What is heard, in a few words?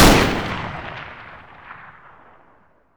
rem870 fire, shotgun; weapons; garrysmod